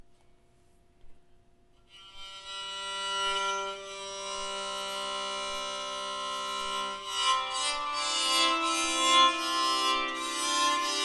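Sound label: double bow tenor tune, bowed psaltery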